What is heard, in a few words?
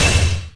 heavy armor